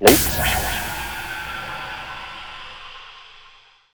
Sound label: tonic target; weapons; dota; ringmaster